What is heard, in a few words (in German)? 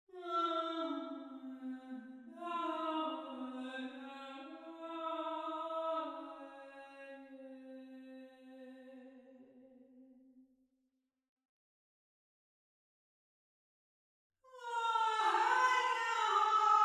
Eine ausgesprochen warm klingende, natürliche Raumsimulationen; Preset „Dynamic Vocal Room“; Decay von 2,45 Sekunden; ganz ohne Direktmikrofon; Eventide Tverb